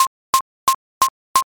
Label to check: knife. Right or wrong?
Right